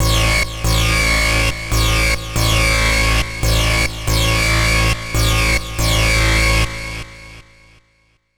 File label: HARD BASS 140 A, Hard Trance, Bass